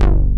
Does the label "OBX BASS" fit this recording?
Yes